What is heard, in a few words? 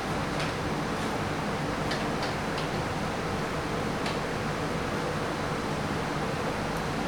ROOM, ROOM NOISE; 1000fx